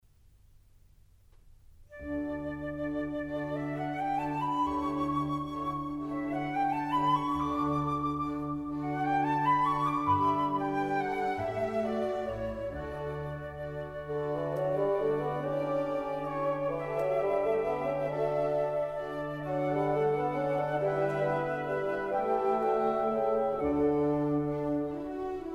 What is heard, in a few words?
Divertimento in D, Notturno Andantino; Mozart